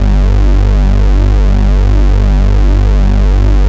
X TRA G2, X TRA SYN

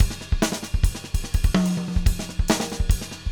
loop, drumscores; Steve Gadd Drumscores; GEMS

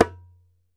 ASHIKO, African Perc

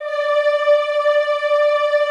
Classical Choir, D4; Best Service; Extended Classical Choir

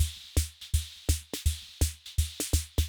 Loop, rhythm king; classic machines

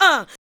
ADLIBS 90; Ueberschall; Jam Box

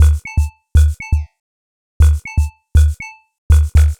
Beat, Modular Electronica; Sonic Foundry (Sony Creative Software); TSS Autopilot

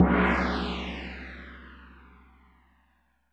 gong, Roland MC-909

